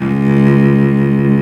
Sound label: CELLO C#2 R, SOLO STRINGS; Greytsounds VOL-1